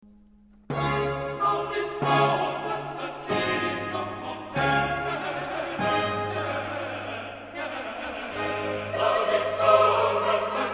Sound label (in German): tedeum Chor